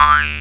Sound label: JUMPING